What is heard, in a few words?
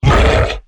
Exertion Light; Evolve; Behemoth